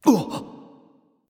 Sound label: nv breath; smite; xbalanque